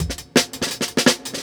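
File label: Jungle Breaks, 167 bpm; Original Jungle Breaks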